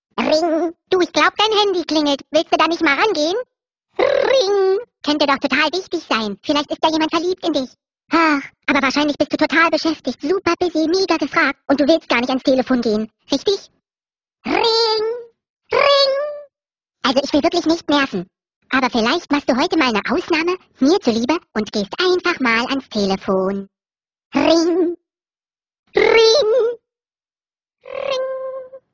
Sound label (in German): lustiger klingelton